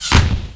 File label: hurt, paintball